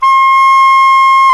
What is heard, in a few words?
SAX SOP MF C, Soprano Sh